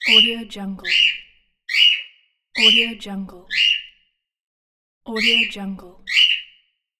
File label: parrot; soundsground